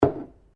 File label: JAR GLASS PLACE BENCH; Various kitchen jars; Set Down Sound Effects; Set Downs (Sound Effects:Objects)